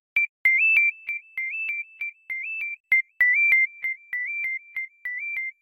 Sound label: High Tone; Bluboo; S8